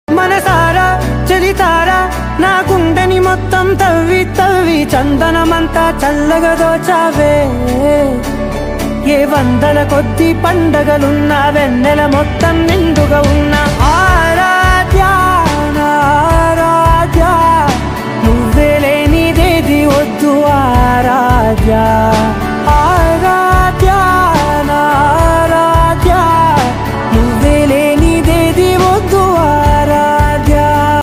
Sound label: song ringtone beautiful love tone telugu